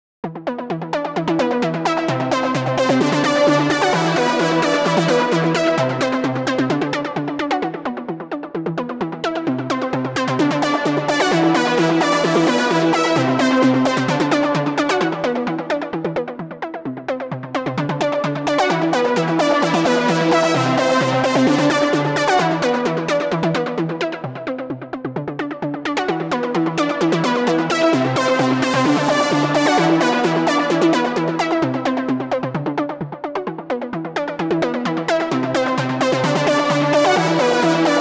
Flowtones Keep Going; Toneboosters

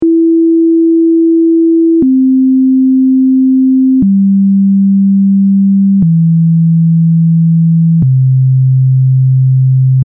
INIT Sound; Logic Pro

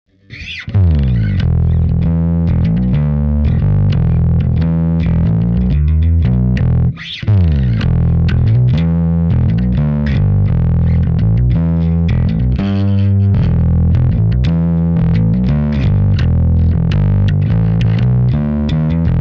Rock

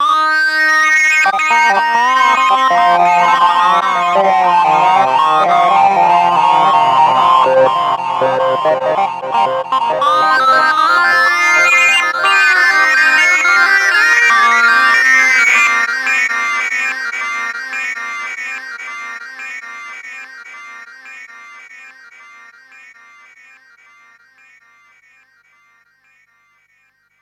SyncLead; Harley Benton D Seed

